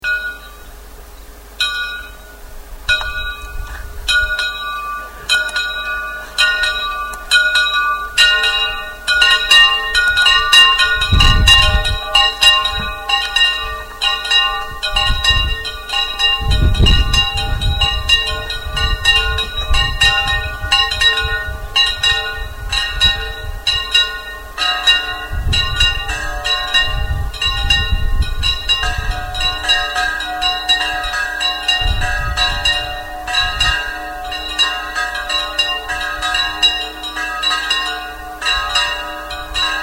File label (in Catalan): campana